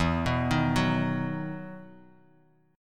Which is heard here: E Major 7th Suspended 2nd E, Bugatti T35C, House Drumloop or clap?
E Major 7th Suspended 2nd E